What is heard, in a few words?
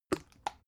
bottle syrup upend bright; berufe; gastronomie